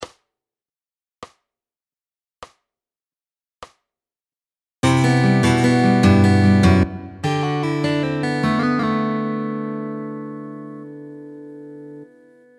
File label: Fingerpicking Fun Superlick